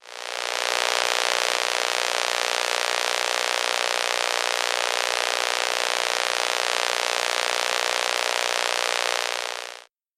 Ordinateur portable connecte en WIFIs 2.4 GHz 5.1 GHz 5.8 GHz; Ondes électromagnétiques et électromagnétisme

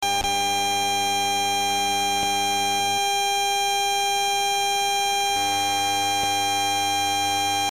BLUETOOTH lors de la cherche d un appareil

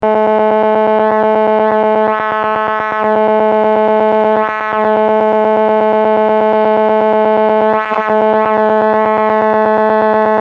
telephonie GSM 2G pulses

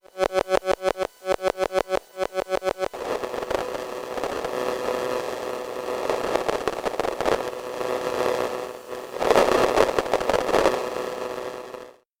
telephone portable 3G; Téléphone portable ou smartphone 3G; Ondes électromagnétiques et électromagnétisme

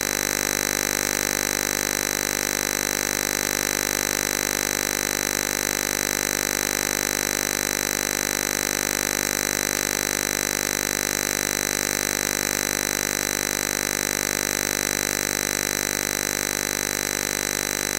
5G nouvelle impulsion radio 50Hz. Transmission a 36 GHz

